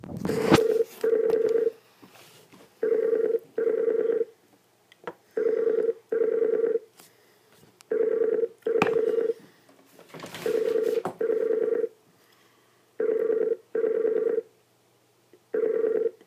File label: Electrified Environment